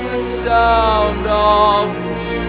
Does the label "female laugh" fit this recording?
Yes